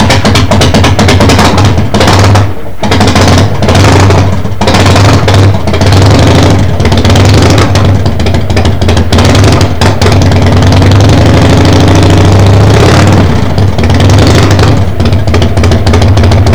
harley, motorcycle sounds

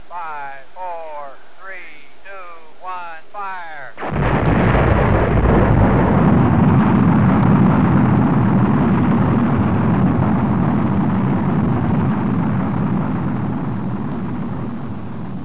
rocket firing

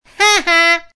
Bully Sarcastic Ha-Ha

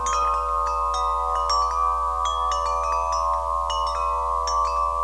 mantra, windchimes

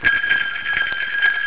sleighbells

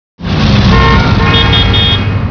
traffic horns

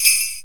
JINGLES, christmas sounds

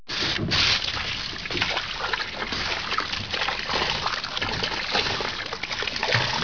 water pump siphon

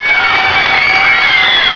GIRLS, scream

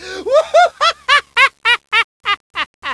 laughing man

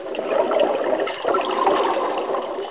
scuba diver breathing

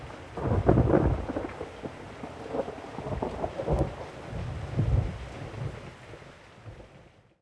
thunderstorm

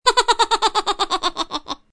Silly Chipmunk Laugh

